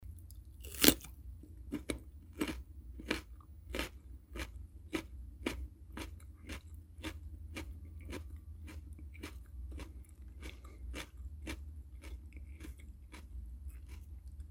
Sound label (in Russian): morkovka; Звуки откусывания